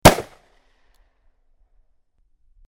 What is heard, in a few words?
unload, weapon; weapon-paragon